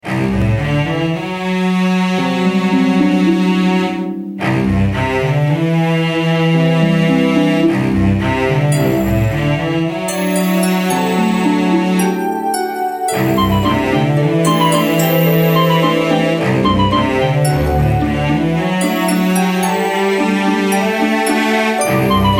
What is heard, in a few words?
Bgm Fantasy; rpg maker mv